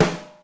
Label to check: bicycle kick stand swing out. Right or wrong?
Wrong